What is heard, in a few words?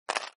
roll show, backgammon